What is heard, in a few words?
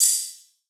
Analog Ride; Cymbals; Drum Samples